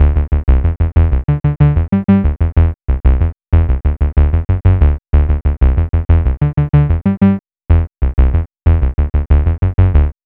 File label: synth arp; Electrocution